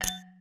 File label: open